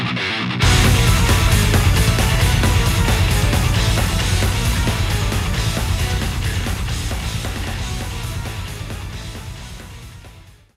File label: dance; Hazama